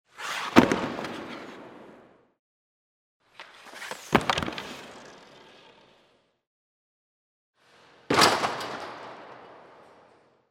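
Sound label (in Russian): хоккей остановка игрока у борта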